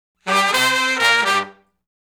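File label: Shuffle Blues Riff (G) unison; Sections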